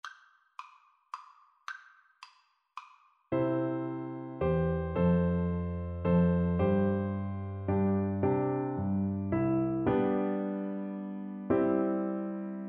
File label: mozart minuet, Trombone